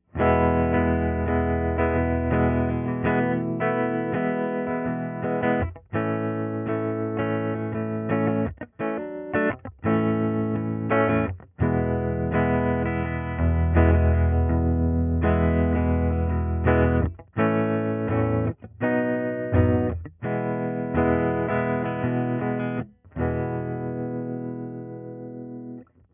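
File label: electric guitar Strum 0cm at edge of cone; ATM25; audio technica atm25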